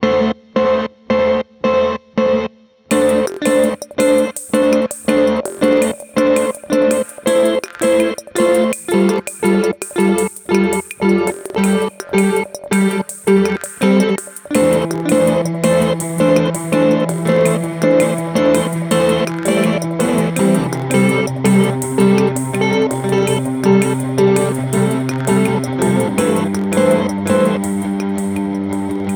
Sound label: Rainger FX Reverb X